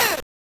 EH; Streets of Rage 1; M8; Genesis

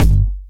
trash can, kicks; RoBKTA Sample Pack Supreme

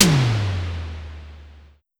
Drum X Tom; Pearl Drum-X

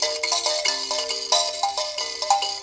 VIB KALIMP, VIB KALIM; Spectrasonics; Heart of Africa 2

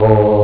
bad choir; ST-XX Amiga Soundtracker Sample Packs

